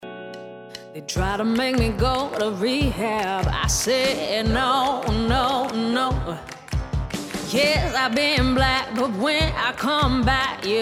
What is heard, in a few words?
Jazz